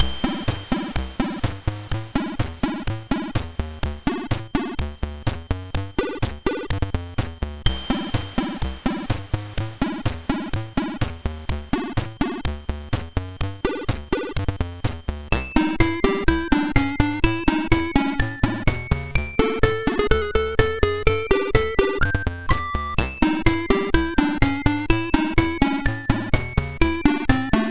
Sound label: sid MOS6581R2; Keystone Capers